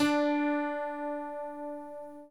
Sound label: Medium Piano